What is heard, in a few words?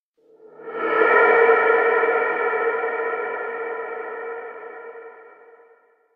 moss; Barotraumatic; Brainmoss1